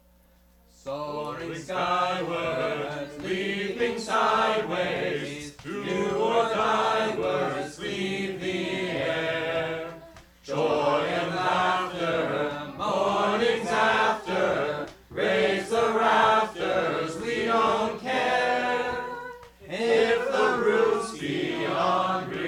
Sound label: unison in harmony